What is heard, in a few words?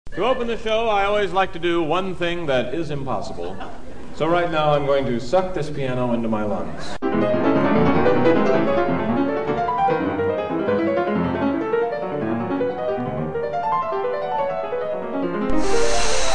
piano